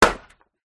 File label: industrial axe chop strike wood single; zapsplat